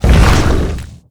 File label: skill explo